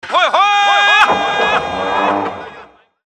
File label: Hoy Hoyyyyyy